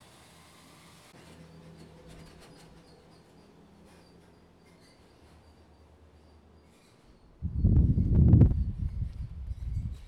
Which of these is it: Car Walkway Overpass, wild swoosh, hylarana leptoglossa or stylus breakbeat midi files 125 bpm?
Car Walkway Overpass